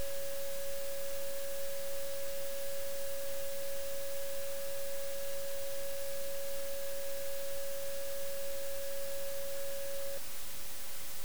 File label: bleed test +45dB